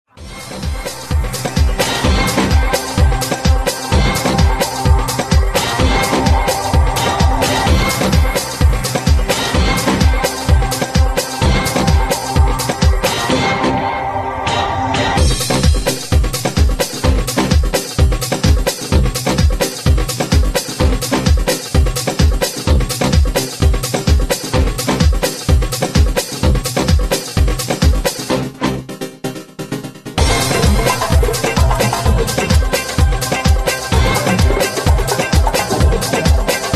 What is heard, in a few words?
Samba